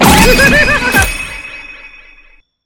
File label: magician copy ult cast; kitty0706 sinclair sounds